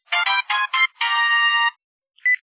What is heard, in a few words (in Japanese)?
携帯音(ノイズ除去); ドナルド